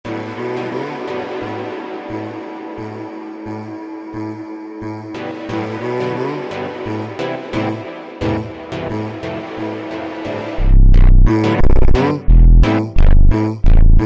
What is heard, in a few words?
Funk Super Slowed